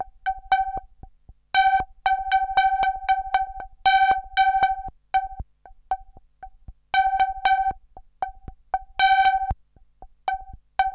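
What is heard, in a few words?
sample and hold G1; Best Service Dream Experience; R100M S+H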